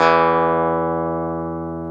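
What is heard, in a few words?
E 12 String guitar; Club-50 - Foundations Roland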